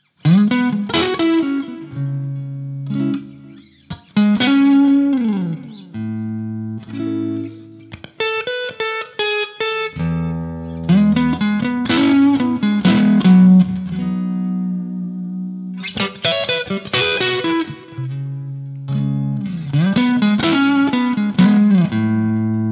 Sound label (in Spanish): solo, guitarras